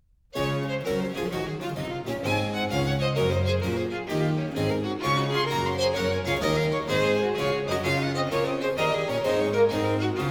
3 violins and basso continuo; Canon and Gigue in D major; 2. Gigue; Pachelbel

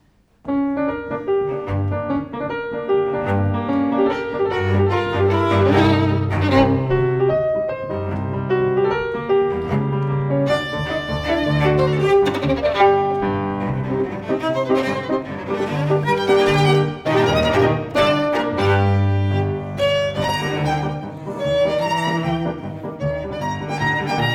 for piano trio

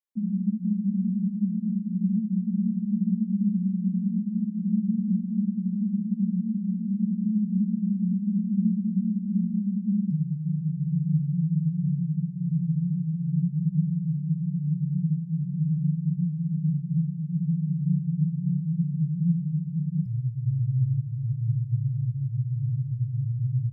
Subwoofer blend (slow); Subwoofer Evaluation and Setup; Surround Spectacular

